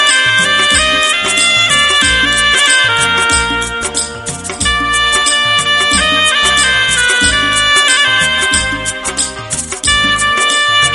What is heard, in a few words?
instrumental ringtone